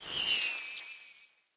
Bow Corrupted Arrow By; Weapons; Enemy; Bows; Hunter Disguised Bow; Dendrit

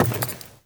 Walk Wood; Enemy; Footsteps; Lady Deora

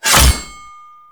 Crafting Equip; Cetus

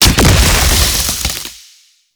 Grenade Explosion; Warframe; Lasrian Soldiers; Weapons; Lasrian Goonadier Grenade

